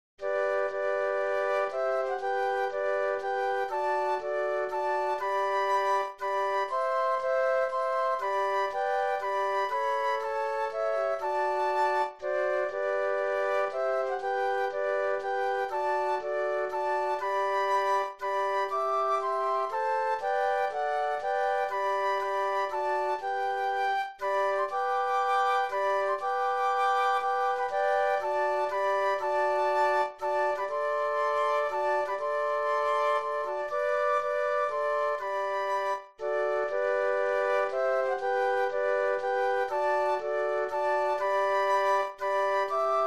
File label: Flute Trio